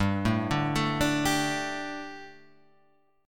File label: G Suspended 2nd G, Guitar; Gsus2 Chord {3 0 0 2 3 3}; arpeggiated